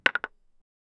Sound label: a wooden pickaxe hitting, mining